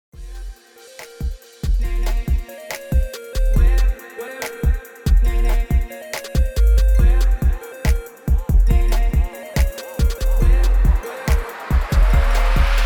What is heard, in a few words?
MUSIC SOLO HIP HOP